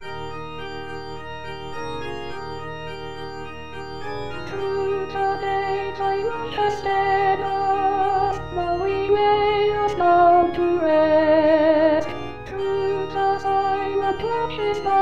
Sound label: Through the day Sop